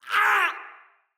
Death, Soldier Male; Dendrit

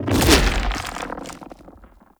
Arm Turret Throw; Enemies; Warframe; ManInTheWall

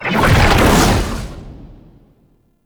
Perfect Heavy Attack Activate, Weapons Melee

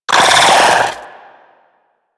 Arachnoid Wraith Vocal Attack Short

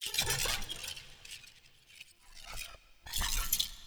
Moves Arm; The New War; Lua War Room Part Two; Erra; Cinematics

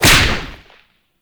Whip Crack Small; melee; weapons; Oraxia

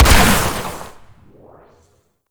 Amplifier Barrel Fire; Weapons; Operators Amp Barrel Part A Fire C; Warframe; Amplifiers

